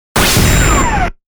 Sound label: Coin